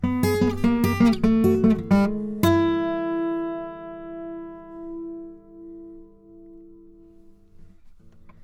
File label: source guitar